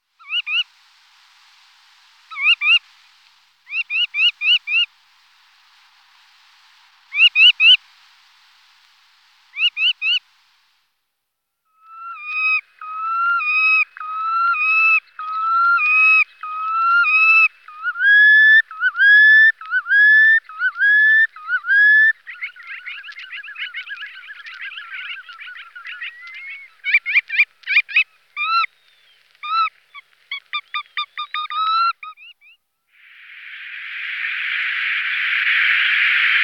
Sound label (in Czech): Jespak rezavy Calidris canutus